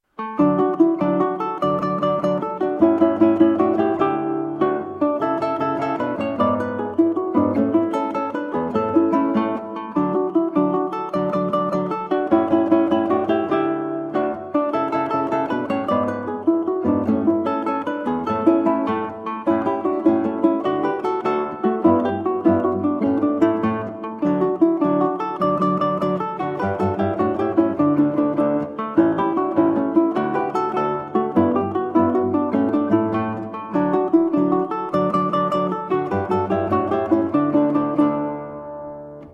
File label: Presto from Baryton Trio, spoken, Ukulele and Guitar; Baryton Trio 1 Hob XI 1; Franz Joseph Haydn